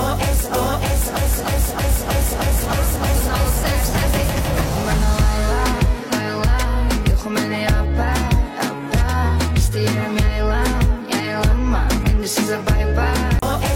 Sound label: mashup